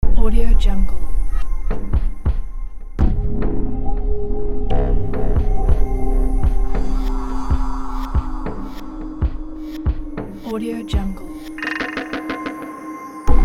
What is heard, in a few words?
havy bass soundtrack trailer song